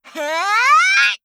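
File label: attack, Voice Line, Korean; Eternal Return; Garnet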